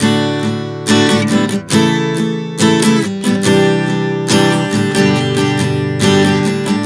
Progression 070 D-A-C-G, Acoustic Guitar, Chord Progressions 070 bpm; Sonic Foundry; Songwriter Acoustic Guitar Companion